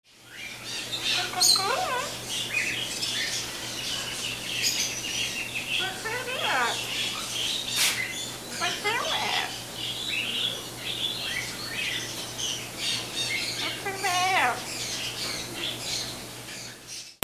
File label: BIRDS